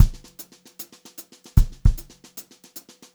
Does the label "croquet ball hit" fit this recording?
No